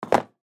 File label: Chair Metal Fabric, Chair Metal Fabric Stack Up; Chairs; Sound Effects:Offices